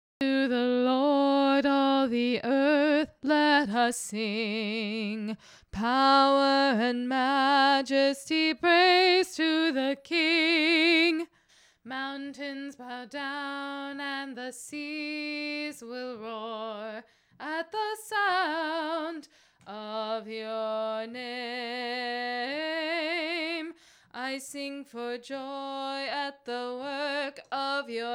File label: alto